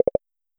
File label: menu back; 『DTMoon』